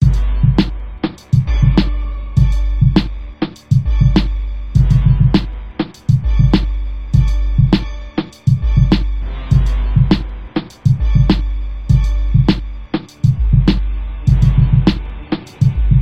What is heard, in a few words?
beat